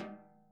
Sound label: SNARE, Interactive Drum Kits